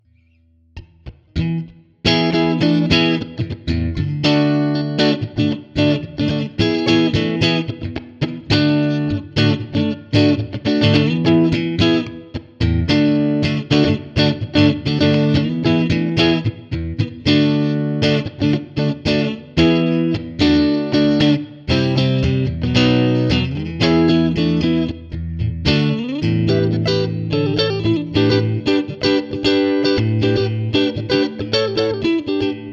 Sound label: Clean Neck Middle - Fender Bassman 4x10, pickups